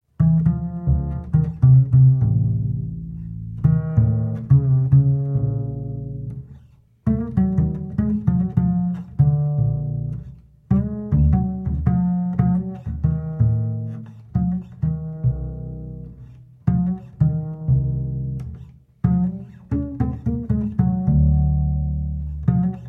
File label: Bass Song solo